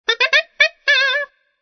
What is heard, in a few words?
SAXOPHONE